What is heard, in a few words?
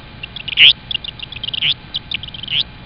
KINGBIRD